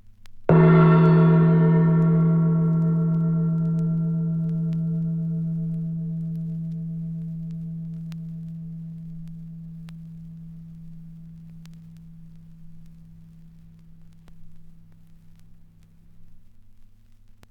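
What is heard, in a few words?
gong vinyl sample oneshot; gong vinyl samnple oneshot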